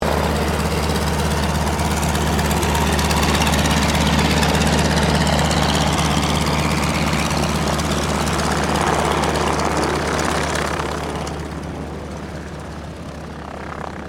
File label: AIRCRAFT STINSON RELIANT SR-8C TAXI; 1935; Airplanes; Vehicles